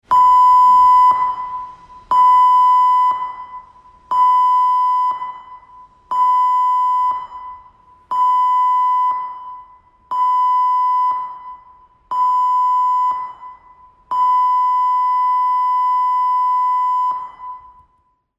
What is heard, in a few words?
Emergency Signal